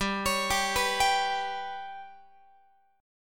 G Major Flat 5th G down Guitar 4A 4E 5A 5D 5G sharp 6C sharp; GMb5; arpeggiated